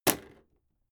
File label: impact sweetener wood hard